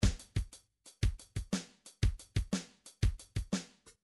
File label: midi drums, shuffle